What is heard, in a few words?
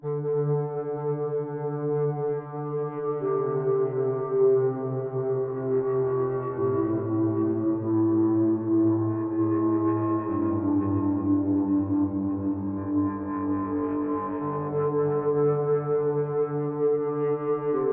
pad, ambient